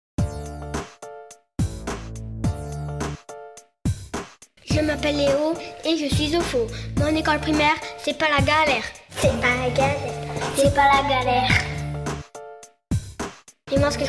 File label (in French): rap